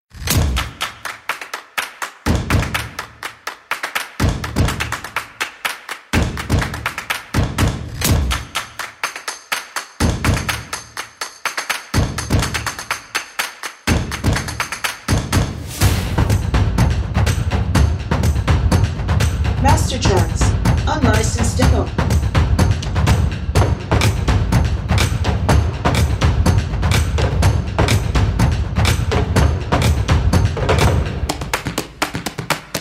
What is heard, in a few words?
Clap Stomp Drum 124bpm